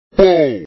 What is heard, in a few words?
bowl